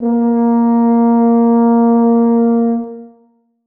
horn a#3; Sonatina Symphonic Orchestra

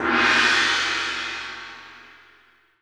tamtam, Percussion; Sonatina Symphonic Orchestra

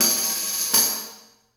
tambourine shake; Percussion; Sonatina Symphonic Orchestra

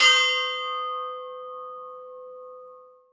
chimes c5; Percussion; Sonatina Symphonic Orchestra

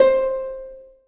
harp c5; Sonatina Symphonic Orchestra

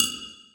triangle, Percussion; Sonatina Symphonic Orchestra

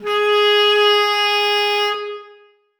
bass clarinet g#4; Sonatina Symphonic Orchestra

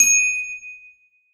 glockenspiel d#5; Percussion; Sonatina Symphonic Orchestra

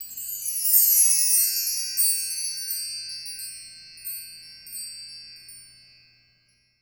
bar chimes c, Percussion; Sonatina Symphonic Orchestra

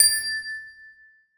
glockenspiel a4; Percussion; Sonatina Symphonic Orchestra

